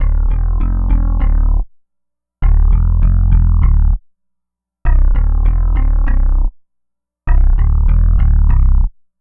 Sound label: Synth Bass; Bass X